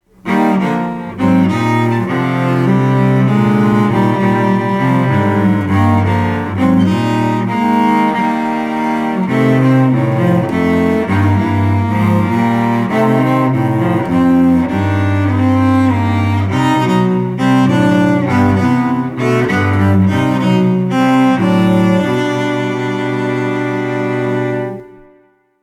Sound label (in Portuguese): Pequeno trio para sax Violoncelo